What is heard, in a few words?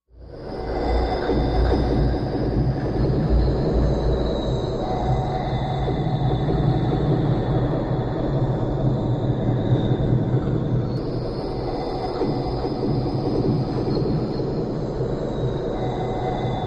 In Air Tighter Ambient Breathy Alien Factory Echoes